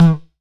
Bonk Tong, Kicks; Airwindows

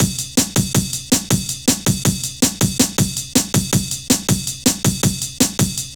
Drum Loops, Jungle, 161

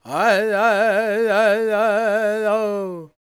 C# MIN, World Voices, LAKOTA

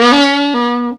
RASP, FUNKY SAX; Zero-G; Funky Elements

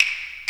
CLACK, JAPAN DRUM; AKAI S6000 CD-ROM - Volume 1; PERCUSSION

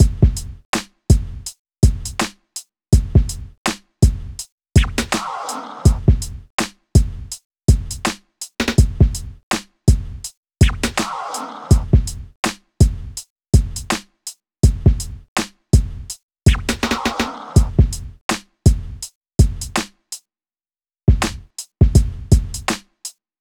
Drums, Hip Hop

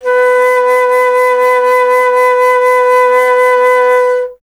Alto Flute, Solo Instruments; Miroslav Vitous - Solo Instruments VOL-2

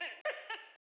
Duck FX, Old Skool House, 120bpm; Big Fish Audio; Construction Kits; House of Amnesia

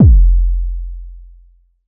bass 808 myth F; Polyend; ARC Noise

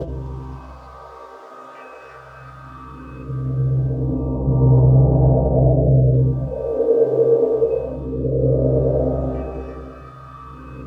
bells

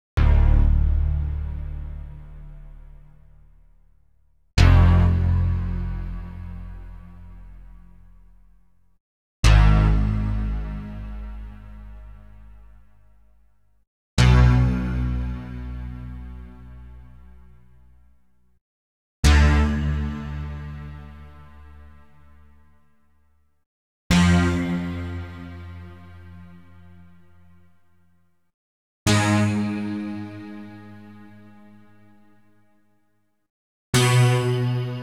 Multi Digital Swing D+3; Klaus Schulze Collection

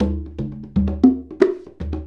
Congas; Essential Percussion

